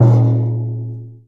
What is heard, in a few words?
Tambourine Clattery; Essential Percussion